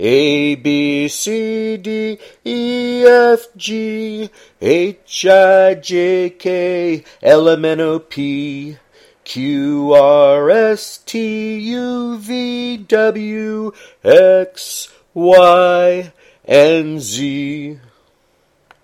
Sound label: alphabet song